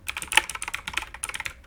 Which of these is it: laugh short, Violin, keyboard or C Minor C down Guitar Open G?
keyboard